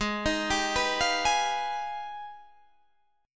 Gsharp Minor Major 13th; arpeggiated; 16,18,17,16,18,16

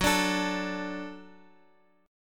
Gb Minor Sharp 5th, Guitar, Open F; Gbm#5; strummed; x,13,14,16,x,x

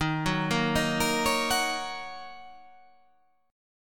D sharp Minor 7th

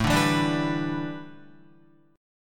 A 7th Suspended 2nd Suspended 4th Guitar; 4,5,4,7,7,7; A7sus2sus4 strummed

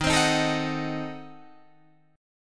D sharp Diminished 7th D sharp, Guitar, Drop D; chordshape; 13,15,13,14,16,14

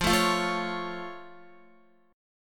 F Augmented F down Guitar; strummed

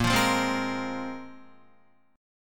Bb 7th Suspended 2nd, Guitar, Bb7sus2 chord; 5,8,5,5,8,6; 4F,4A,5C,5G,5C,6E; Bb7sus2 strummed; JGuitar

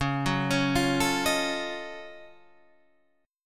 Gb Minor Major 13th Db

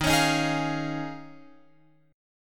Eb 9th Suspended 4th, Guitar, Open F; Eb9sus4; strummed

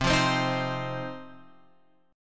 C Minor Double Flat 5th, chord; Cmbb5 Chord {8 8 10 8 X 8} strummed

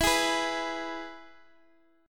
E Major guitar chord, Open D

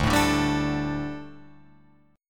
D Suspended 4th D, Guitar, Open F; Dsus4; strummed; 2,4,2,2,5,4

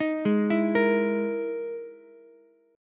E-Major Sharp 11th E, Ukulele C6; EM#11 arpeggiated; 8,8,12,13; JGuitar

